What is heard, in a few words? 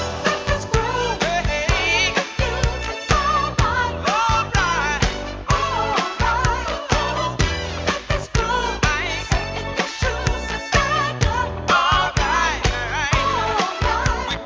Groove